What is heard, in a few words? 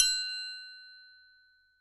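Percussion Triangle; Drums; Acoustic and Electric; Polyend; Simulacrum Sounds; 1 Shots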